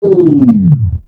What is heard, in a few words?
Clan Dojo Elevator Stop; Doors